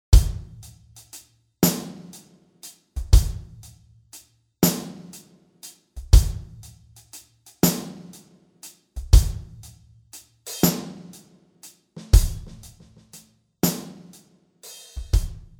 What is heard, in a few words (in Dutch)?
Drum; Toontje Hoger